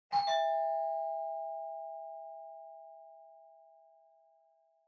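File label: Doorbell Mechanical Tone Far; ドアベル 機械式 トーン04-09(遠い)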